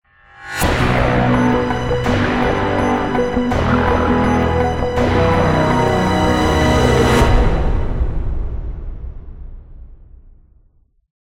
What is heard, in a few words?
Success Stinger; Scraper First Strike